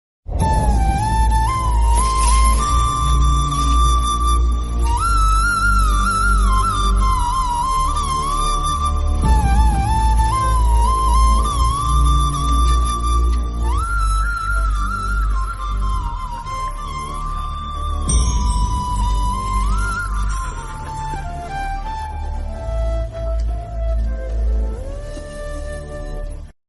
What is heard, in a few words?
flute ringtone; sita ramam